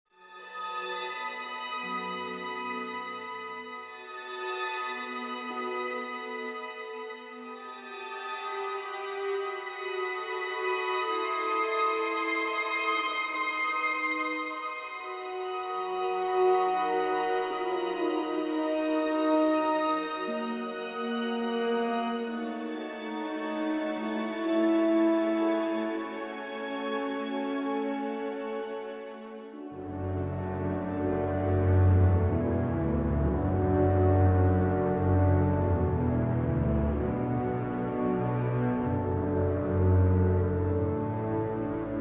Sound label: sneaking